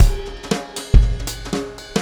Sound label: Shuffle Beat